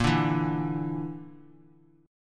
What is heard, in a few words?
A sharp Major Flat 5th, Bass Standard; strummed